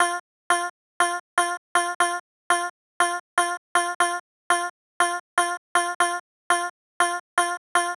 120 F Glitch Rhythm

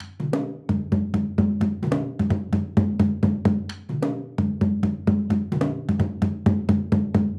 130, DunDun loop Drum Percussion; The Gambia sessions; puzzlepiece

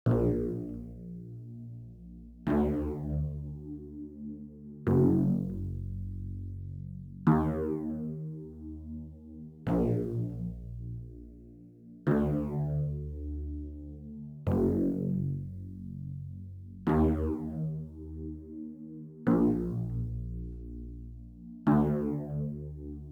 Stab Bass 100BPM Gmin Melodic Analog; Healing

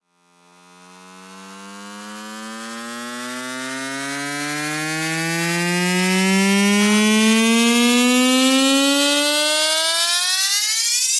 150 F min Riser Fx

